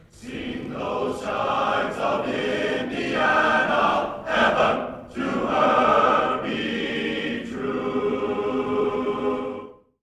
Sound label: Mens Chorus; Chimes of Indiana; IU All Campus Mens Chorus; 1989